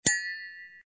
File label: bell; minecraft